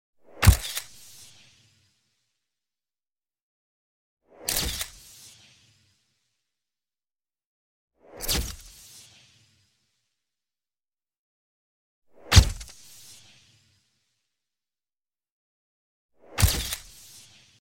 Camera Picture Shot with Whoosh and Electric Flash Tail; Hollywood Edge Sound Designers Tool Kit